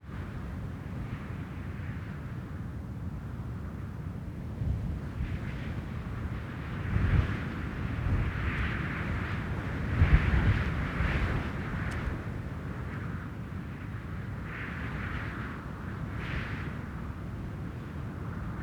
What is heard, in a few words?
Wind in grass Velebit mountain; Ivo Vicic; Natural ambiences vol.1